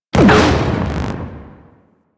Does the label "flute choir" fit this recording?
No